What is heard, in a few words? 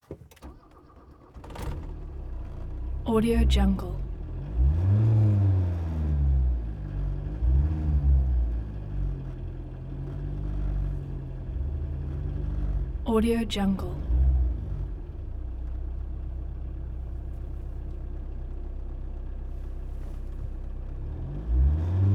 volkswagen rabbit car 5 speed diesel